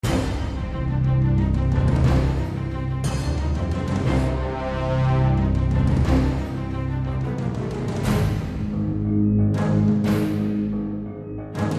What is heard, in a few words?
Action